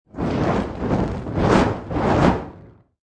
Torch Movement Throw Fast Wave, Fire movement or fire ball, flame whoosh through air several time; Flames; Fire movement or fire ball, flame whoosh through air several times